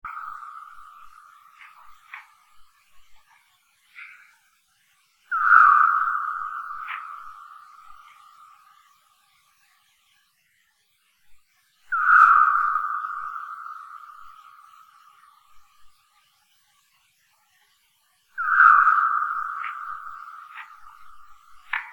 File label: Tadarina australis